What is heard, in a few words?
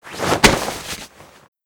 big jump; Modern Warfare